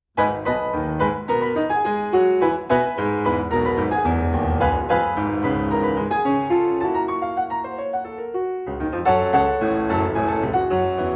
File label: rags g-sharp minor